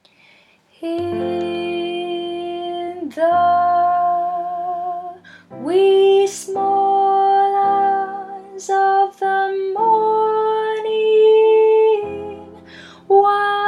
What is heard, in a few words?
Tenor